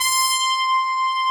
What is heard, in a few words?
TRUMPET C5; Greytsounds VOL-1